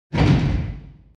Cell Door Pushes Open; Hollywood Edge Sound Designers Tool Kit

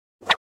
Swish Single

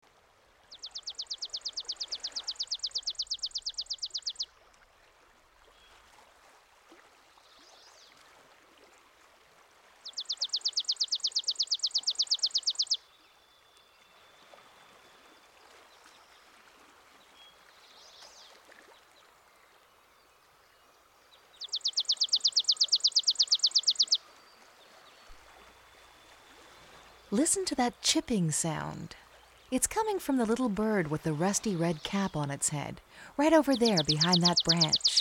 chipping sparrow

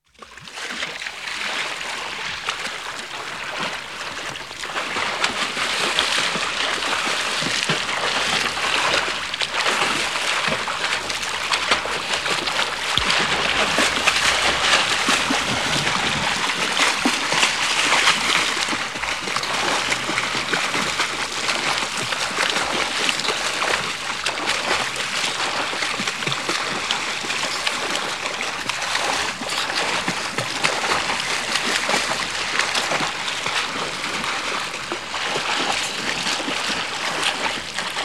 Wading In a Stream; Dr. Sound Effects; 210 Nature Sounds - 20 Hours of Relaxing Natural Ambiences for Meditation and Sleep

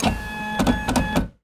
PRINTER; 1000fx

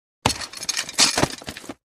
Chain Metal Movement With Cloth; Hollywood Edge Foley Sound Library

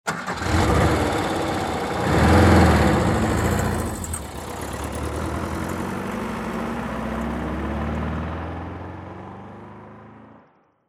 Porsche 912 Starts, Drives Out; SOUND EFFECTS1

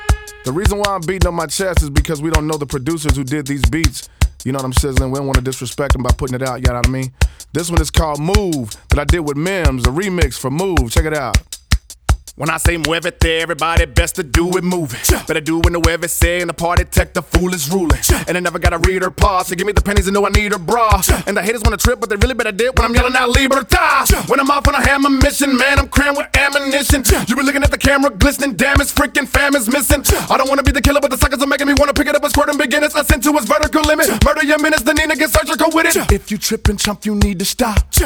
Acapella

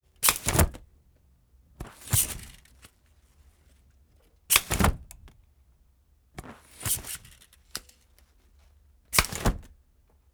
Umbrella Self Opening Two Part Fwump; Foley; Props; Foley Essentials

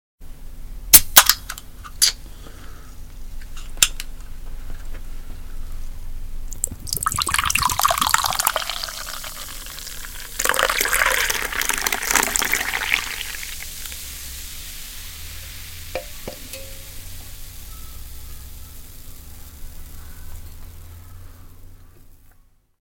Can Open Pour; 100 sound effects

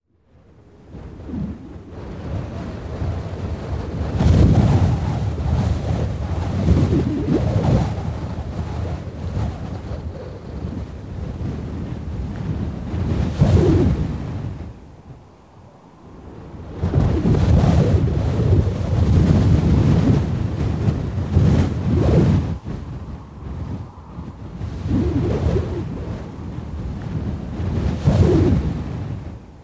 Heavy Wind Gusts on Leaves

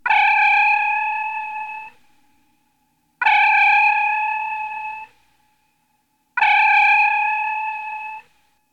Transporter Energize; Star Trek Original T. V. Series Sound Effects

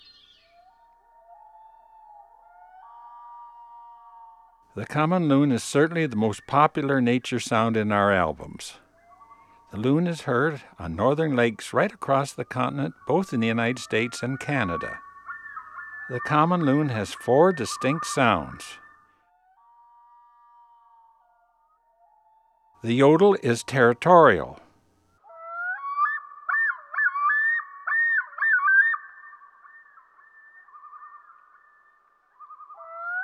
Common Loon; Eastern North America; Dan Gibson; Wildlife Identification By Sound

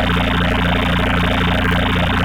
FM, synth fx

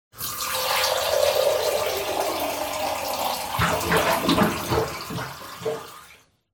Water cooler; SOUND EFFECTS1

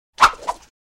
Swish Multiple Swish Very Fast; Crashes Impacts

